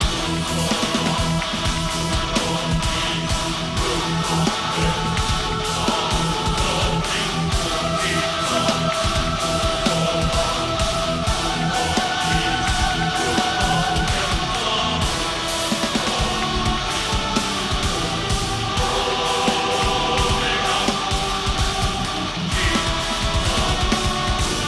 Mx Burial Ground Fight Lp

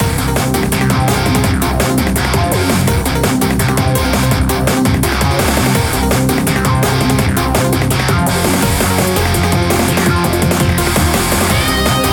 BGM Ingame

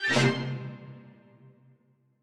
Cooking Success Finish

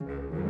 Music Dialogue Neutral Start 60bpm

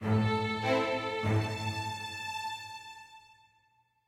mysterious forte end

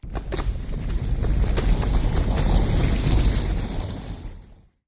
Elevator Natural Start

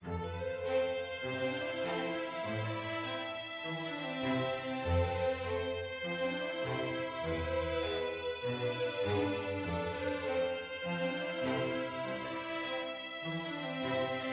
adventure med

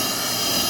Gaz loop; Puddle; puddle plus ios gamerip 2018

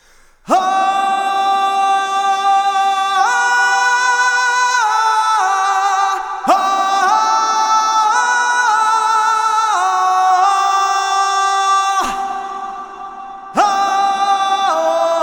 Warrior Song

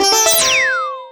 Character Attack

